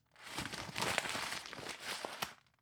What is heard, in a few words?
Paper Crumbling